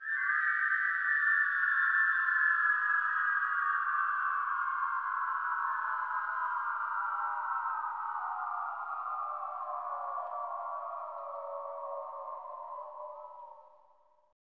CHO WHIST, CHO Whistle; Sound & Vision - Gigapack I CD 2 (Roland)

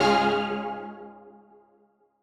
Old Skool C#3

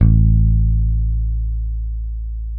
SADWSKY5MUTE, Bass; Sampleheads; Will Lee Bass Library

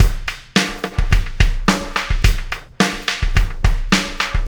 Oscillation Beat; Sonic Foundry (Sony Creative Software); Processed Drumkits Zero Gravity Beats; Oscillations

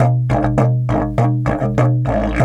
DIDGE 100BPM

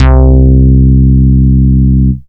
Single Electric Basses, Dance Floor Bass

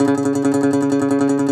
DOMRA, Plucked Strings, Segundo Domra

